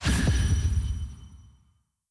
Magic; blue archive

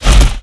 Drone Vulcan Attack End; blue archive

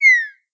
Emoticon Motion Sigh; blue archive

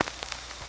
REC; blue archive

